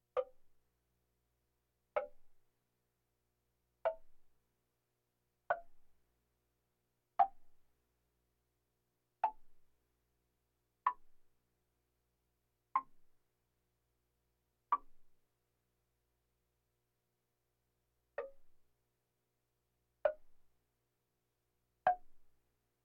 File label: Percussion Bongos Tympani rolls Gongs Drums